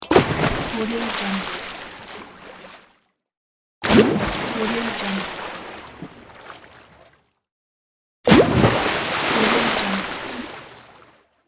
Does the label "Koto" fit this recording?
No